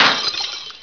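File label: GLASS BREAK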